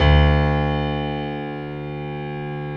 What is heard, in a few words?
PF ST D 1